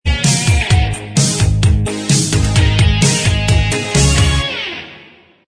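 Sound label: theme instrumental track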